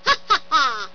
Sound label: Heh heh heh; WhackIt! Weenie Edition